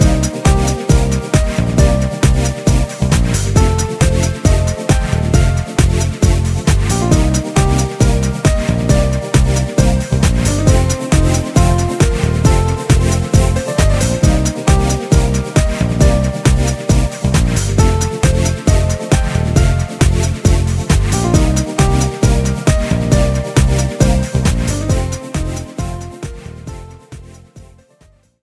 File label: Disco